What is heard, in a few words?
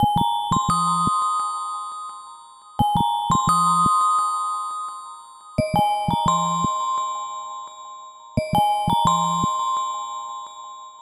Ding